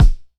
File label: Floor, Jomox Kicks; HyperFocus; Airwindows